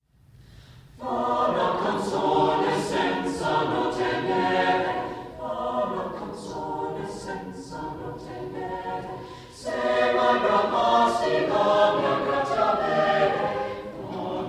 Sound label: choral; gv choral festival; fa una canzona